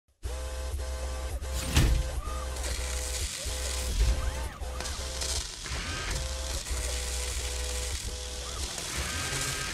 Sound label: satisfactory asmr